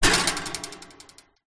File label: impact metal fence chicken wire vibrate